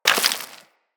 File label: Bullet Impact Debris Rock